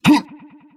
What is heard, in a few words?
death